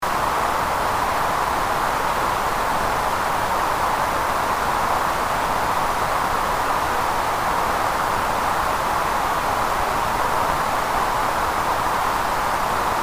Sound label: Pink Noise